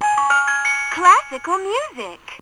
Classical Music, toys

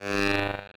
perc

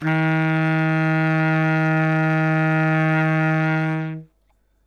notes e flat, sax; Dirt-Samples

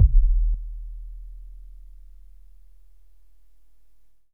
kick; Super-Dirt project

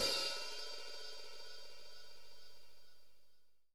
open hh; Super-Dirt project

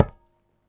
dead hit, tabla; Dirt-Samples

